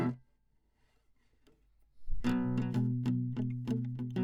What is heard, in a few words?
Classic Guitar